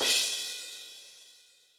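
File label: Fast Crash, Yamaha DTXpress Cymbal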